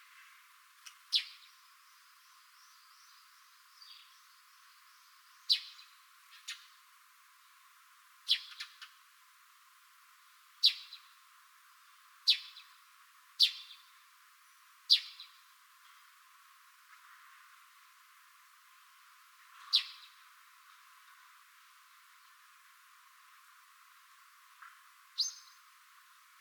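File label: suzume morning loop, sparrow; anemoi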